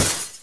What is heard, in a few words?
GLASS CRACK